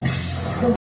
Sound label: switch